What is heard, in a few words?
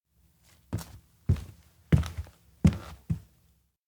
steps indoor abandoned cafe walk approach; anemoi